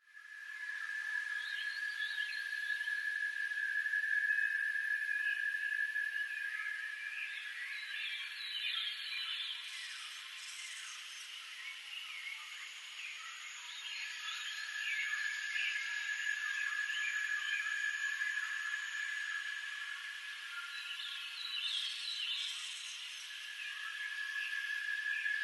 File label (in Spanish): Sonidos de la Jungla Animales y Naturaleza